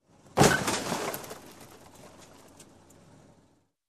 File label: Car Crash into a Tree